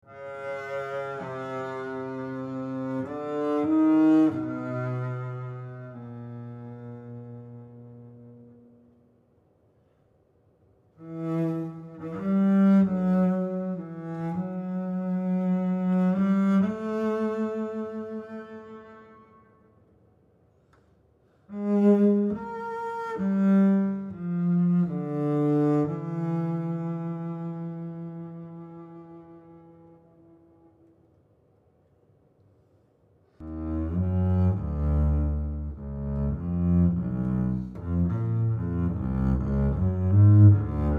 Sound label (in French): Contrebasse solo; Cycle des siecles